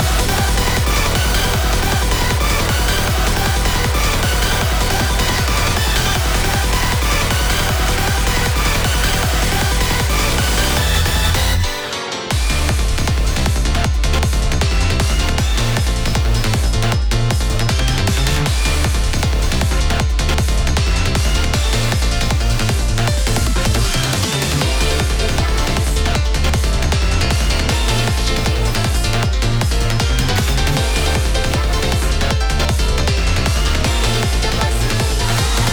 Loop; Goddess of Victory Nikke

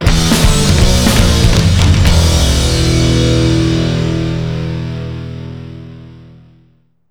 Goal Sad; Harley-Davidson: King of the Road; arcade

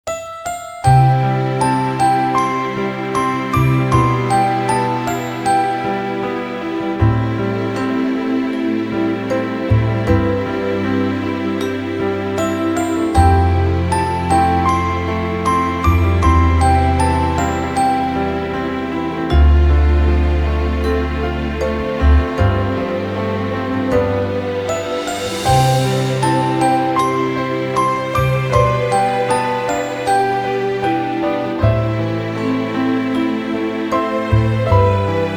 Relaxing Sounds

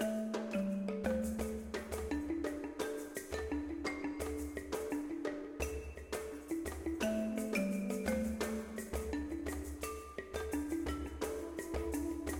Music Morocco ambient

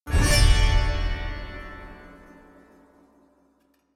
Puzzle stinger

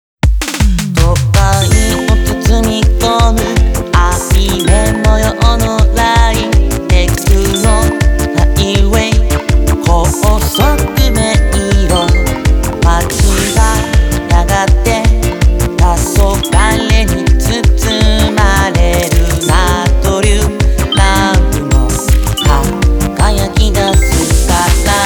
Techno; crossbeats rev.; arcade

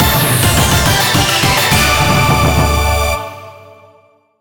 JINGLE Gatcha; Tabe-O-Ja

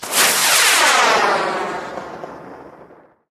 Large Bottle Rocket Launch

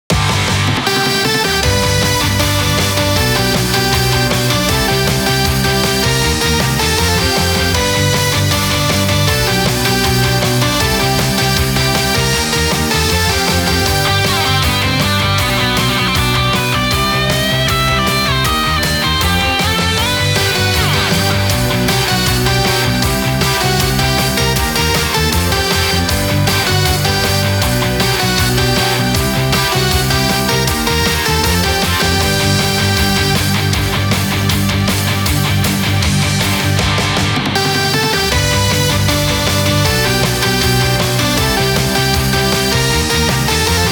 Happy Instrumental; pachislot gi derby club 3; Dreamer

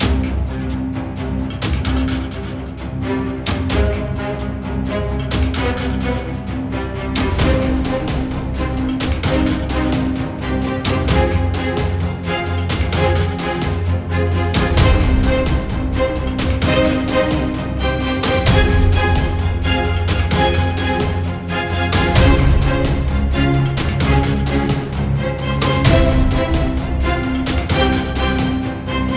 Epic Battle Looped; Dual Blade: Battle of the Female Ninja

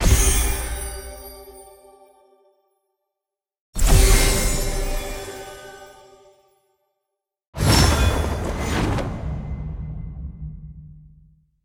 Ui Card; Archeland

